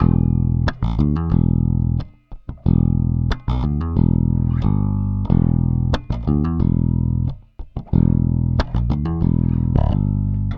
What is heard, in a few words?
Rock, Bass; Bass X